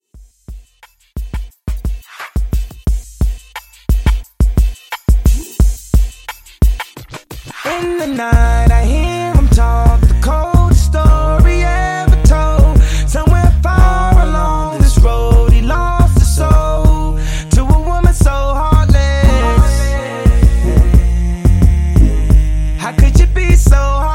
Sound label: R&B Redrum; Remixfreakz